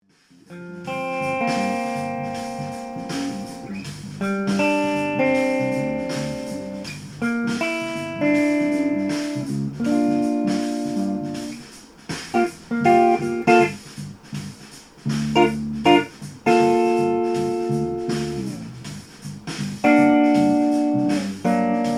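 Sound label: gm7 tension guitar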